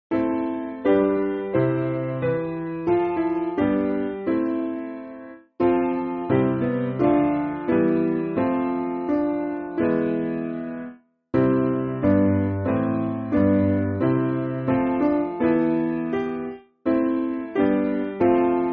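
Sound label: Piano, Backing Files for Churches; Now The Laborers Task; Pax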